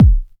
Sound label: kick 909